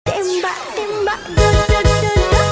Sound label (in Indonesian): tembak tembak